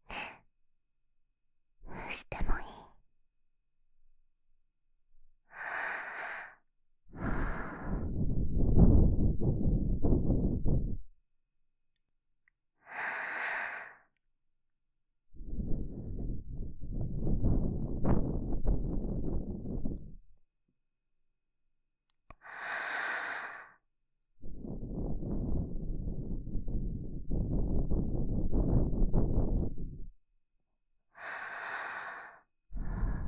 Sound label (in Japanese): 吐息（右耳）, ASMR